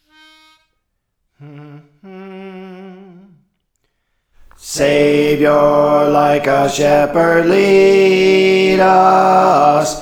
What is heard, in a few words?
Alto; Praise For The Lord Training